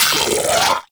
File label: Arachnoid Vocal Pain; Warframe; Corpus; Arachnoid Hunger